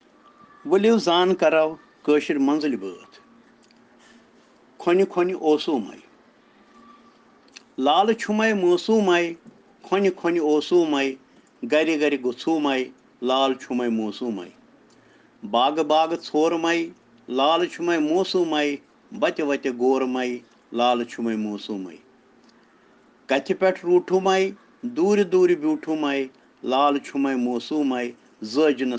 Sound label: Children Rhyms in Kashmiri